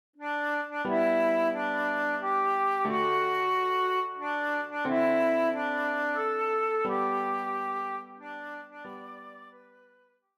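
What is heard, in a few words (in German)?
Querflöte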